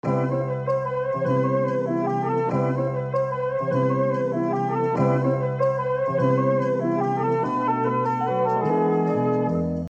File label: 195bpm Trumpet